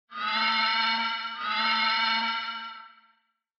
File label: Retro Anime Siren Fast